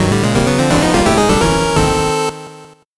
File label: 8bit jingle fast